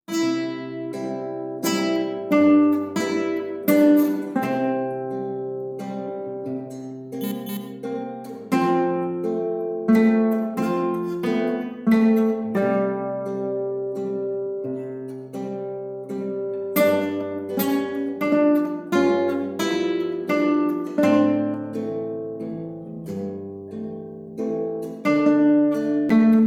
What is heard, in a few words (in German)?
Leise rieselt der Schnee, Gitarre